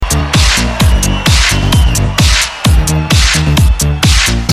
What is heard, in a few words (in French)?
musique